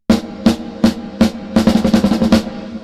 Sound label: snare reverb De-reverb